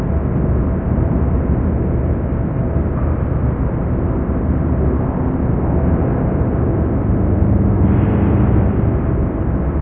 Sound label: amb industrial muffled lp; video games; ambient